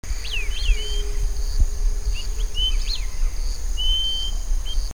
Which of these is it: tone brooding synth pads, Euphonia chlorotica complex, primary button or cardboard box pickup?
Euphonia chlorotica complex